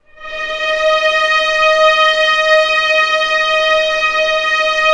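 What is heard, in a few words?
strings D#4 PP R; Sound On Sound - Peter Siedleczek's Orchestra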